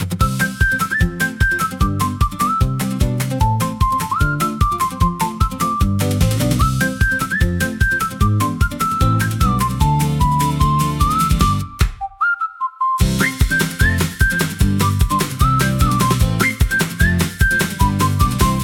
Sunny Start Sprint, hand wraps whistles for cheerful active